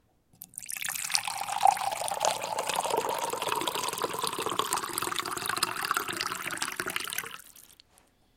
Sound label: pouring cold water